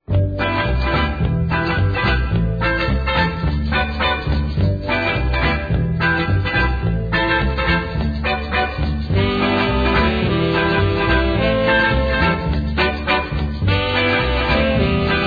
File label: Hats and Dogs Stomp; The Wreck of the Mary Deare